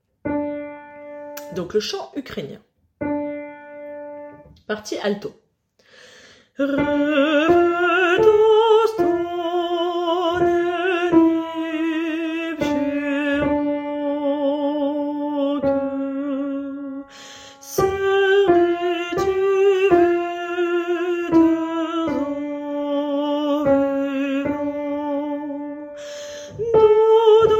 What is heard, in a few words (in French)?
Chant Ukrainien Alto